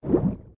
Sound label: swim; liquid